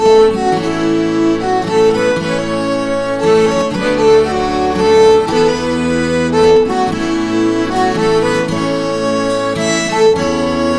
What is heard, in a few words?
Waltz